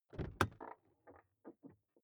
car door open interior hummer h3

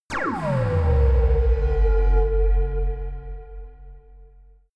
dark short laser blast; krieg-waffen; soundpool

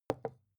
glass put down heavy; objekte

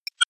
click digital; maschinen; signale; soundpool

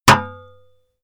metal hit wood; hits explosionen; metall

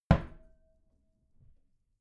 wooden door close soft glass clang

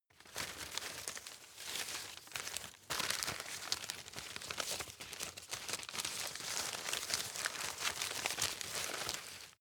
rustle sequence, foerster; berufe

